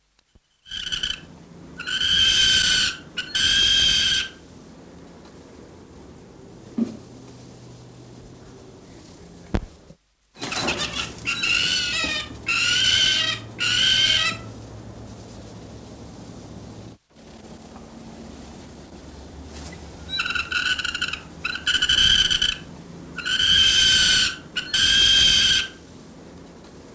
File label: nz falcon song; conservation, native animals, birds